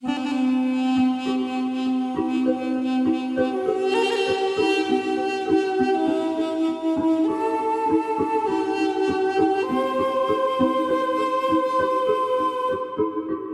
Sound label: mysterious voice duduk, 432 Hz